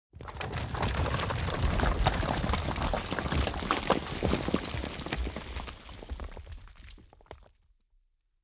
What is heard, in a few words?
Narrow Path; Blind Quest - The Frost Demon